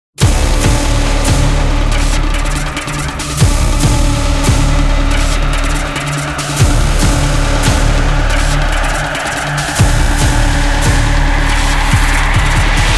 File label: boss intro; zombiesurvival